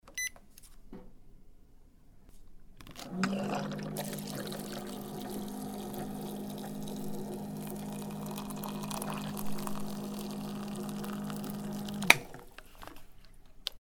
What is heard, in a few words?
pot hot water